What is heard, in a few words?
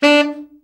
BRA TENOR SN, SAX